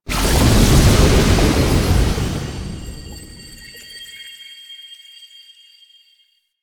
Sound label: Dissolve Door Stinger; Warframe; Ambience; Entrati